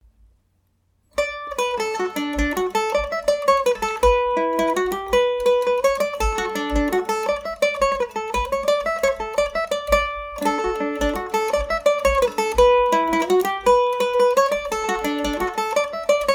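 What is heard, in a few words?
shandon bells d major revisited, mandolin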